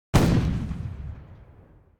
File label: gun cannon; NCH Library